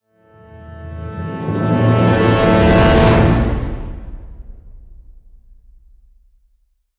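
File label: Hit Orchestra Brasshole A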